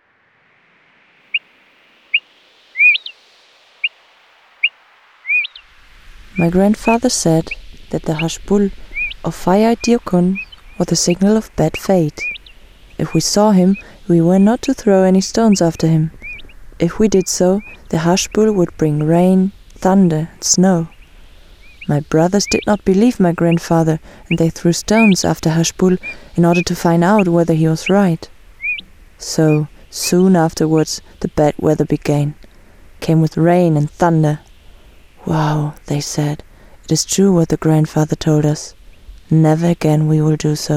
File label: Fire Eyed Diucon; Birds